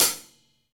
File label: Rock Closed Hi Hat, Roland BK-5